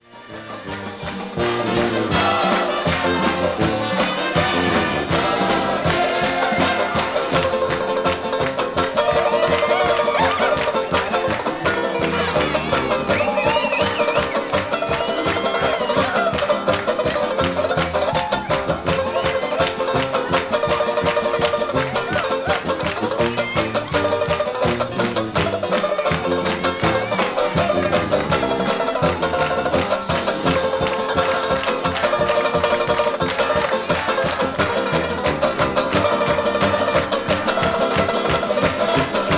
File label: beer polka, banjo